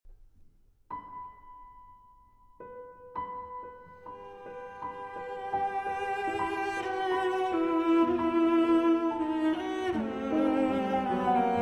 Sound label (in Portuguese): Violoncelo e Piano Choro – Canção; Suíte Brasileira